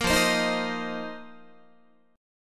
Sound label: F 7th Suspended 4th F down Guitar; strummed